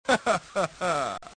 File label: laugh; pulseman